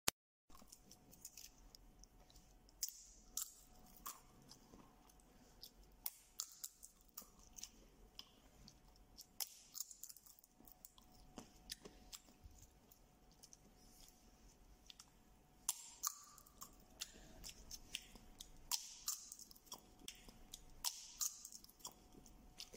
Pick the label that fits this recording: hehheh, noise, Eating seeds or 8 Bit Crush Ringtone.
Eating seeds